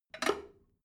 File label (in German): drain valve open regular, badezimmer